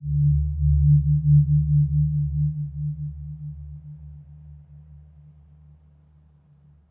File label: tenement low pulse; ambient; lfe; Half Life Alyx